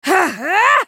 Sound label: spell cast effort; Karma; Character Voices; English